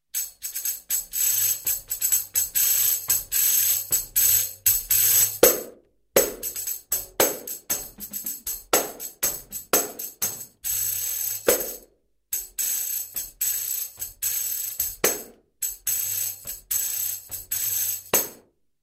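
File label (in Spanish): Pandereta, Percusión; Membranófonos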